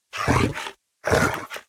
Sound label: Warbeast Snap; Puzzle Chronicles; PS3; PSP; X360